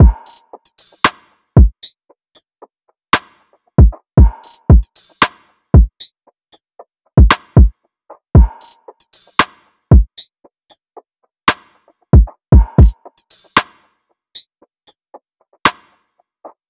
Drum Loop 115 BPM; Round Two; Cymatics; Vibes II Drum Loop Collection

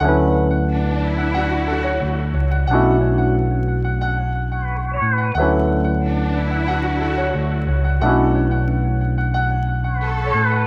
Classic Melody Loop - 90 BPM D Maj; Cymatics; 2020 Melody Collection

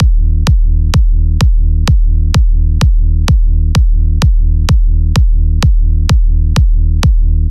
Kick Loop EDM; Drums & Percs; Loops

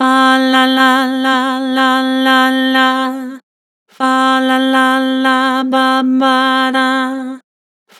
Vibrato Multi, Vocals; Harmor Vocals

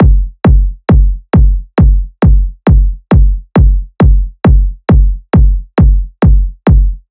Kick Loop 135, Techno Drums

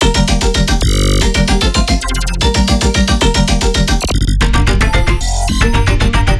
Melodic Bass Loop G 150 Electric, Color Bass; Loops; Ghosthack - Color Bass Essentials

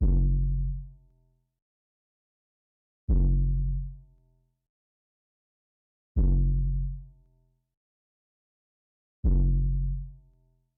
156 BPM F Min Bass; Cymatics; Intergalactic; 2022 Melody Collection Platinum Expansion

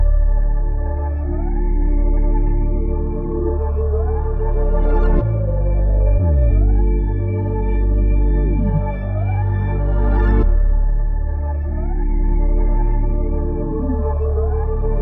92 BPM D Min, Melody; Cymatics; 2022 Melody Collection Platinum Expansion

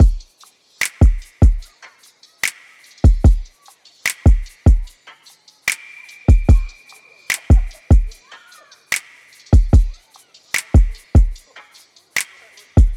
Drum Loop 148 BPM; Friday; Cymatics; DOPE DRUMS VOL. 1

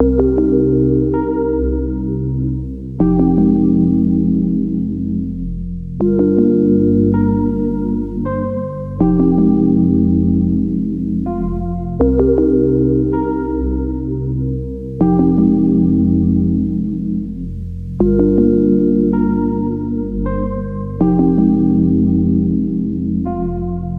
80 BPM C Min, Lofi Melodies; Bordeaux; Cymatics; Lofi Starter Pack

